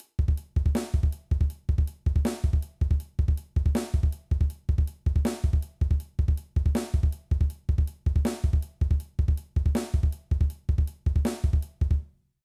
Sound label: Kick Drum Technique Exercise